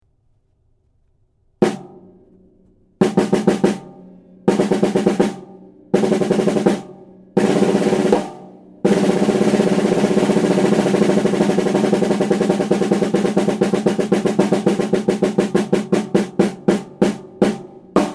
Ludwig 5x14 Supra; BO Badge

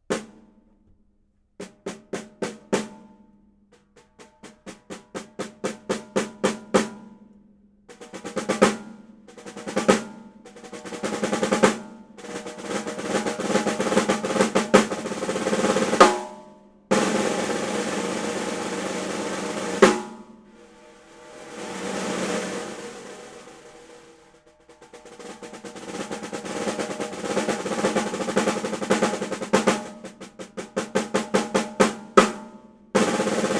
5x14 Rogers Dayton Powertone; COB PT

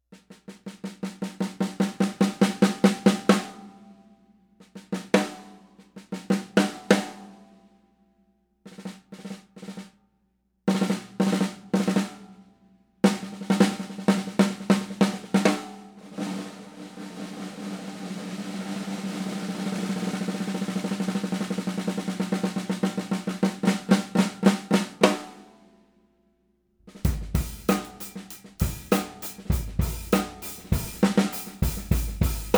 Birch, Craviotto 5.5x14

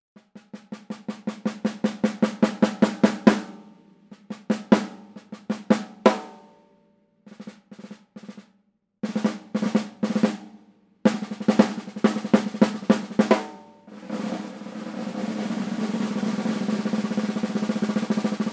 Sling Deluxe; Slingerland

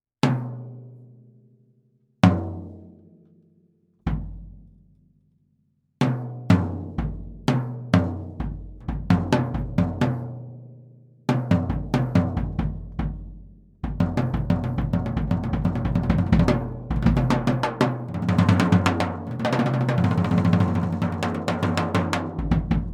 Gretsch Blue Sparkle

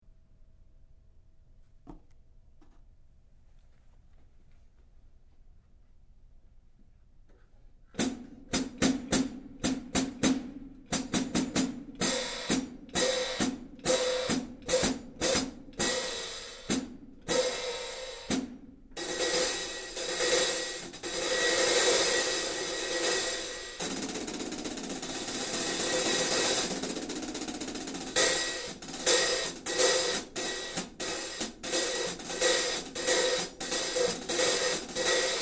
Istanbul Agop Om HH; 15 OM; Istanbul Agop HHats

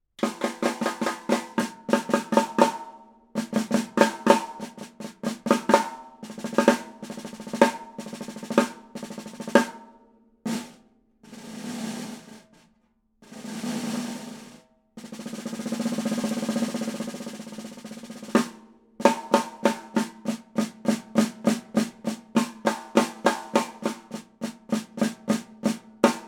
Ludwig Supra, Ludwig Supraphonic 5 x 14 snare; 1970's B&O badge

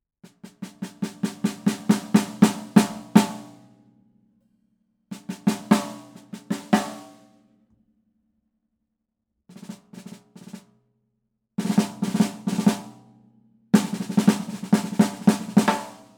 DW Craviotto, 5x14 snare drum

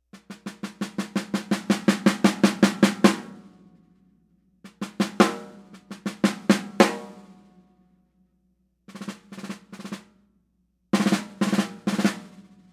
Ludwig 7x14 Abalone

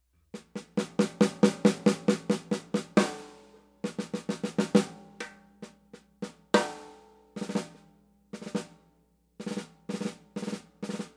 Ludwig 6.5x14

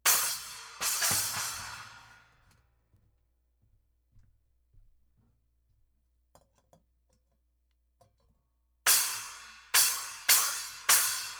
12 Splash

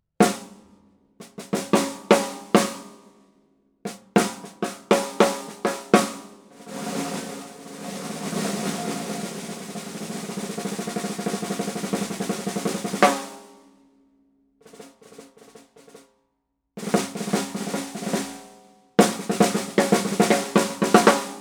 Gretsch Solid Shell Birdseye Maple Snare; Stanton Moore

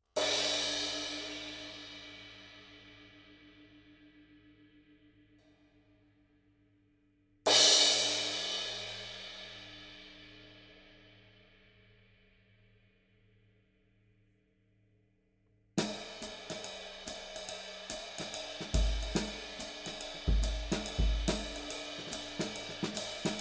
18 K Istanbul

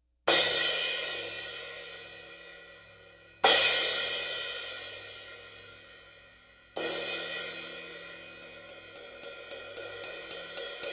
20 HHX; Sabian

